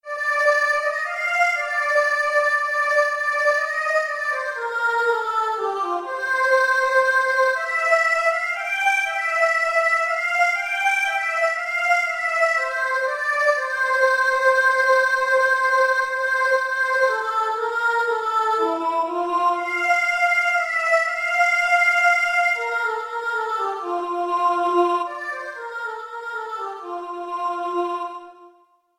chorus soprano

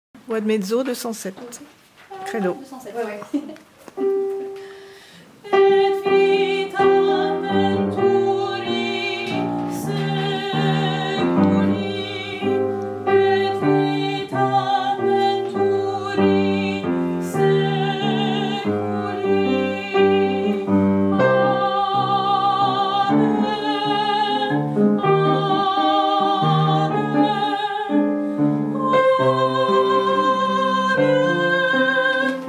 credo mezzo

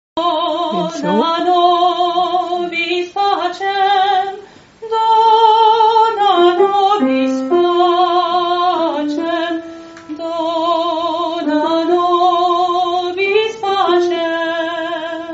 mezzo

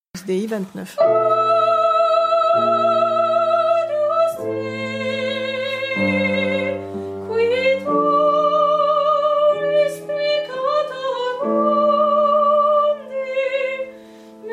agnus dei tutti

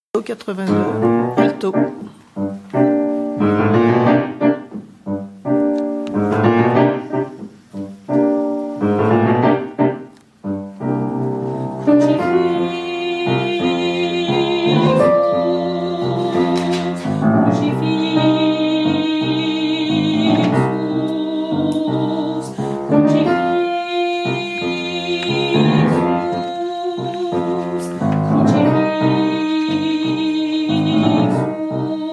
credo alto

